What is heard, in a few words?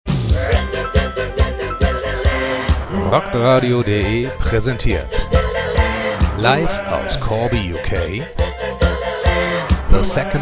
Jingle